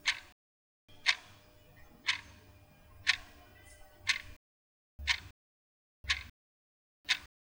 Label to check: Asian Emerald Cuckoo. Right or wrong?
Wrong